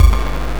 Odd Kick Layer; Kick Snare Layers; Drum Bits; layering

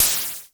KLONE NOIZ, Klone Dual-Percussion-Synthesiser

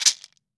maracas; Cuba

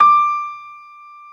pno D5, Piano Grand; AKAI S-1000; Retro Sampler; Piano Grand PF St

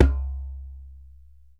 DJEMBE, African Perc

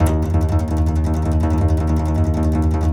C. Bass Balalaika, Plucked Strings

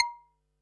Perc, Percussion and FX; Pearl SC-40

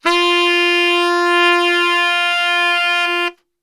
F 2 SAX STR

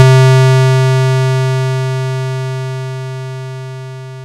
RY30 Pulse; Yamaha RY30

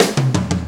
4-4 Fill, Drum Loops

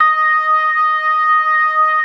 CLASSIC C3, Synth and Organ

